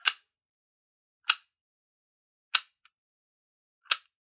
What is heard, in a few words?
Generic Button Metal Small; boom library; The Interface